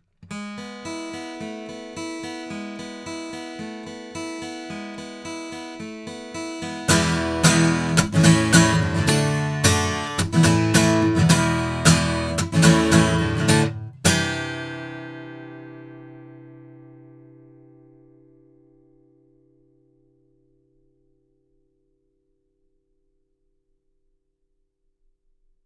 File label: Sontronics Orpheus cardioid guitar